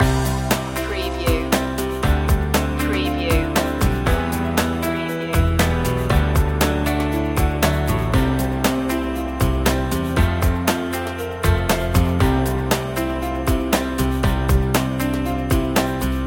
loop, chill

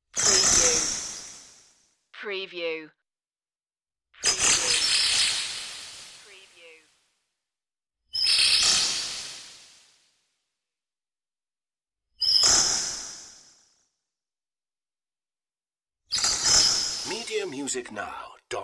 high frequency beams

